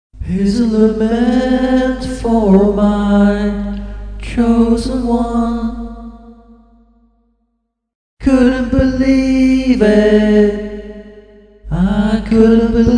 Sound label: Lament, Vocals Only